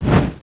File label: Move whoosh